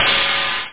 PITCH RIDE

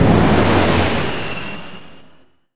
warp exit; Earth and Beyond; EA Games

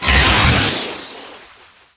draco hit; DAoC; Toa; Gamestar

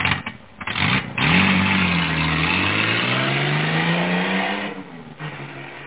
sports car drives off

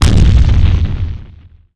explo near; Starsiege

